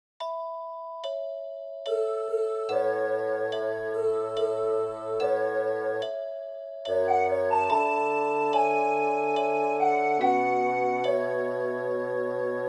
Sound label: HARMONY TRIO Alto Bass